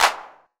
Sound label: CLAPS HOU; Best Service Dance Mega Drums